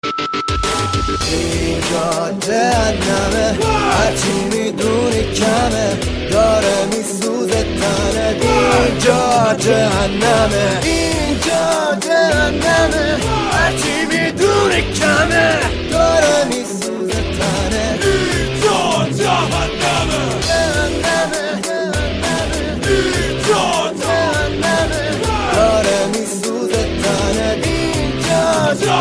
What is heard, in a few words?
Persian Rock